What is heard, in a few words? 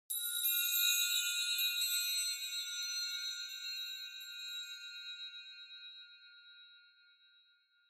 Cluster Chimes (Reverb Gentle Short)